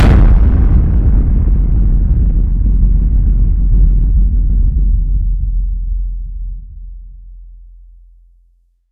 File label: a bear sound